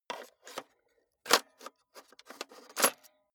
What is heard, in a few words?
cassette into cassette player struggling